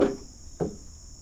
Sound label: perc